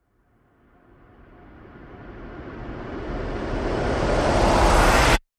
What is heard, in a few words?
Windy Reverse; Mountain Breeze